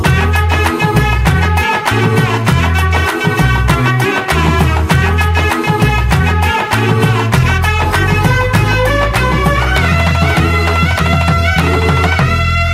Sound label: Telugu Ringtone